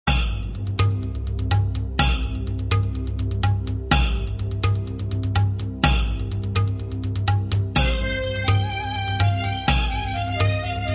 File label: Ritual Beat Sudan